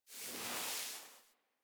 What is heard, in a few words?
Foley Idle Stand; Warframe; Kubrow